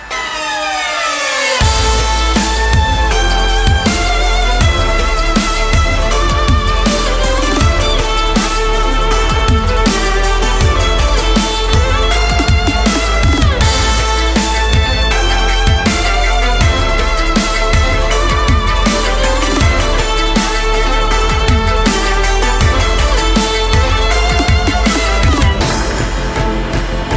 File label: Violin; Shinsuke